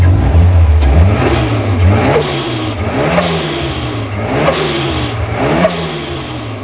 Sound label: Pop off Laser